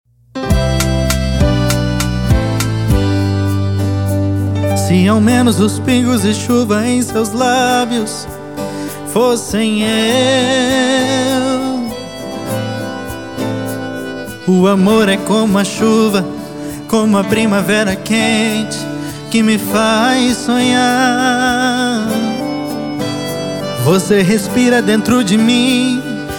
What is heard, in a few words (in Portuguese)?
sertanejo sofrencia